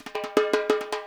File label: FILL, Percussion; InVision Interactive